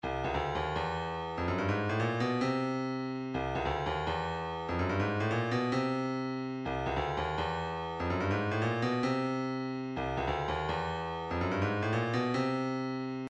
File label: 135 ocean drum, midi